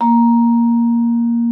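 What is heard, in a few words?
vibraphone A#3; Xsample VOL-4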